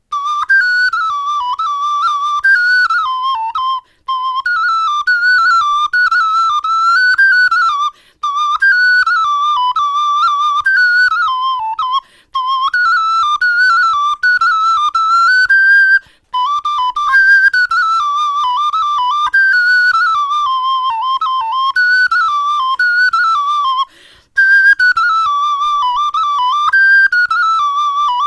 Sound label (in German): melodie